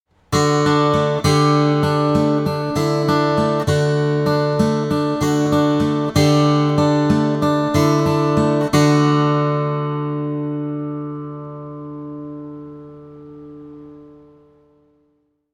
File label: D→Dsus4→Dadd9→D, guitar